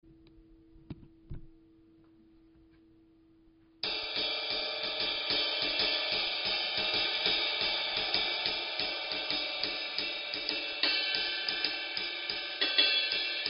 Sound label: Meinl Byzance 20 Medium Ride